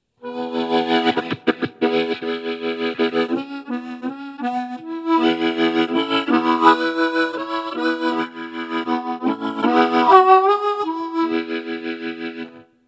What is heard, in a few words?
Rotary; Fireball